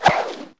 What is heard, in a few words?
TURN